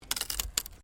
WOOD PLANK TWIST

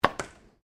GUN TOY DART SHOTGUN SINGLE FIRING; Toy Guns (Sound Effects:Toys)

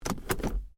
Hyundai Accent Auto Gear Shift; Hyundai hire car; Hyundai Accent 20 08; Cars; Vehicles

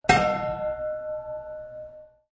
Big Old Bell Single; Musical

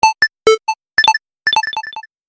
Pings, Ping Sound Effects; multimedia

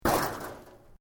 METAL LIGHT CRASH; Metal (Sound Effects:Materials)

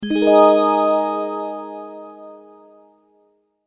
multimedia tone alert; Tones; Sound Design; McKinney Sound

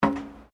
FOOTSTEPS WOMENS LOW BOOTS ESCALATOR STEP; Footstep Foley; Footsteps (Sound Effects:Human)

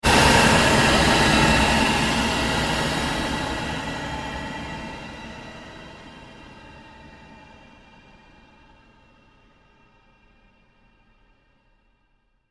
Created Pulse Fade, Rise And Dive Sound Effects

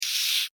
Beetle, Hiss, Vocalization, Insect, Bug; Beetles; Sound Effects:Animals:Insects; Blastwave

